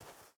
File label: footstep burlap sand walk; Rust